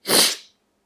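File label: runny nose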